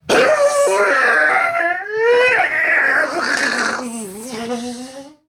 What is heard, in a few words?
Death